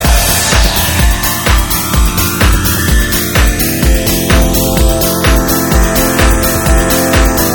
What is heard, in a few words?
Guitar; Ringtones